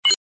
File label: clink; ohms law